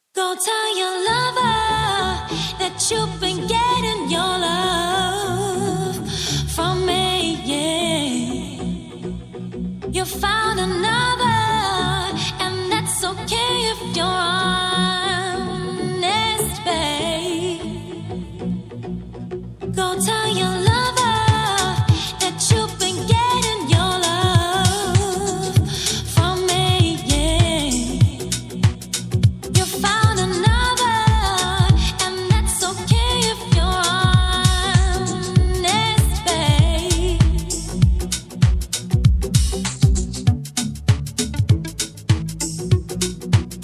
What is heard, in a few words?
happy music